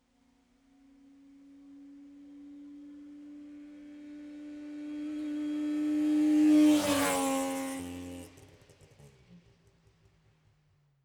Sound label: car saab 92 exterior pass left to right